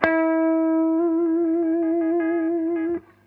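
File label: BEND05, BEND guitar; USB Soundscan vol.31; Miro s Grooves; AKAI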